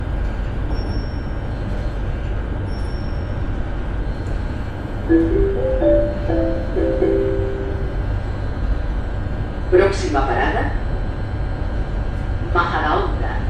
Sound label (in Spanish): tren